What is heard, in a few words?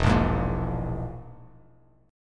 E Major Flat 5th E, Bass Standard; strummed; JGuitar